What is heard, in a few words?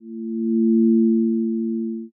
zero g